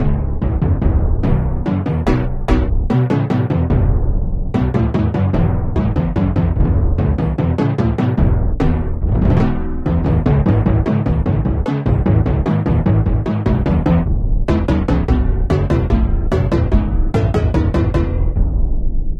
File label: Drum; The Bard's Tale Remastered Trilogy